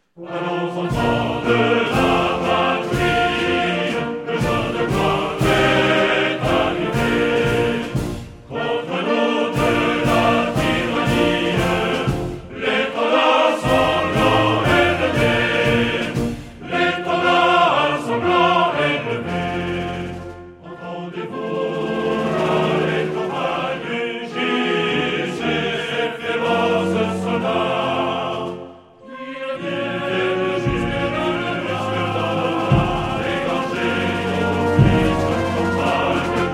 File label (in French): hymne national france marseillaise garde republicaine paroles musique